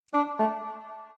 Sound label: sky honk whale